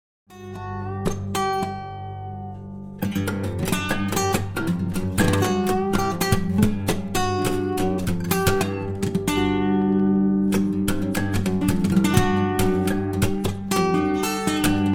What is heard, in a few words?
steel string